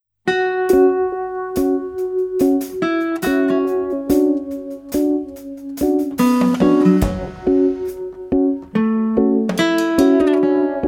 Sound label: Acoustic